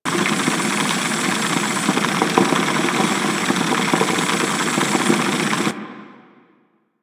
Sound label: Coolant Machine Loop, Ship; Ambience; Props; Warframe; Corpus Ship Remaster